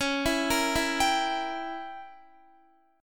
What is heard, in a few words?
Db Diminished 7th, Guitar; Dbdim7; arpeggiated; tuning=4F,4A,5C,5G,5C,6E